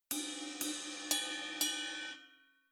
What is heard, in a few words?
Rhythm Disco Ride